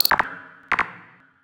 combine mine active loop; hl2

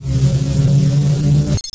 physcannon charge

